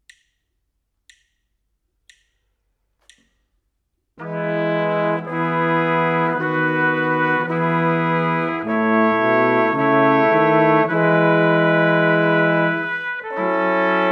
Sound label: harmonic dictation